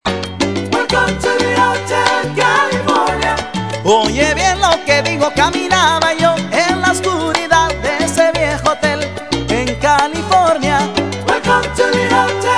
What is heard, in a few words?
Salsa Clave